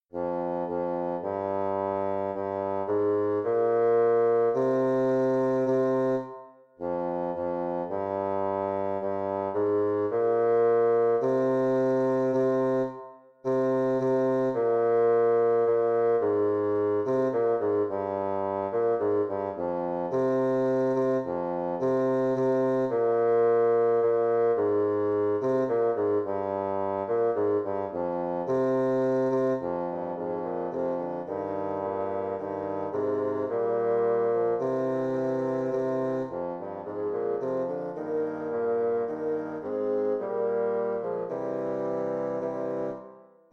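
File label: chaconne bassoon trio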